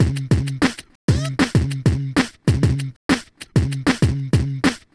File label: meatbox, Beatbox, Drum loops